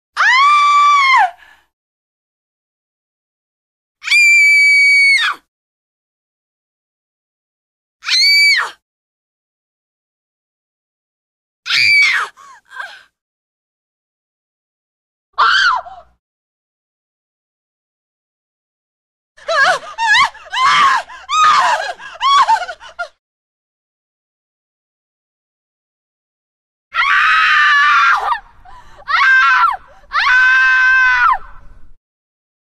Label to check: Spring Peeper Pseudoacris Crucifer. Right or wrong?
Wrong